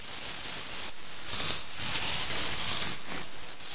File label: noise breaking; LOOPING AMBIENCE